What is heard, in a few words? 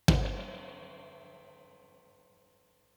Floor Spring; One Shots; Touch Loops; ClassicBreakSessions; Sprung